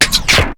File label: BLAP; BEAT2000